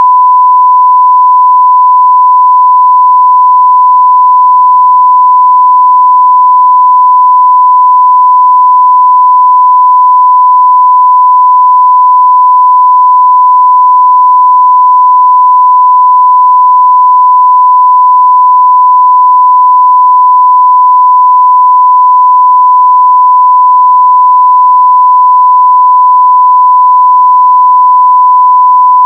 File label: 1 khz